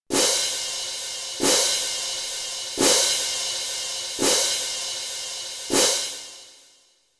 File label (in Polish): talerze